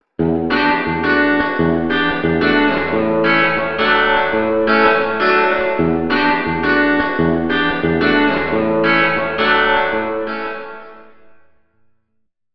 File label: guitar